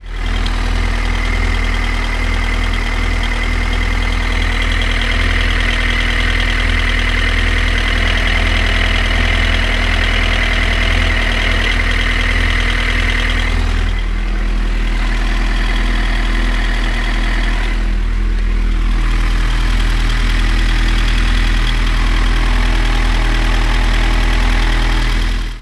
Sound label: z1000 idle several spots